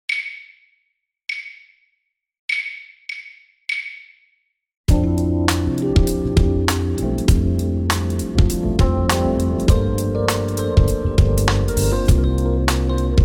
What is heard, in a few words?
duo 100 BPM, fluit